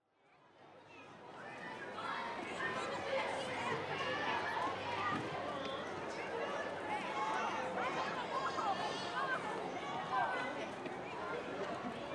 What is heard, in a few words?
large crowd